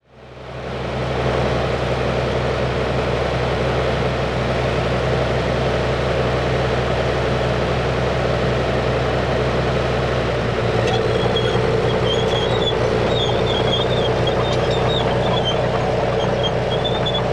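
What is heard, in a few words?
zvuki tanka, tank sounds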